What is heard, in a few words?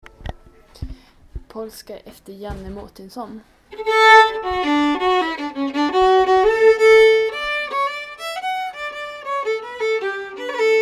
Polska; Polska e. Janne Mortenson